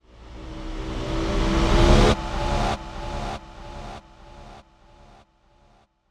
Swell buzz and hiss increase in pitch with whistling delay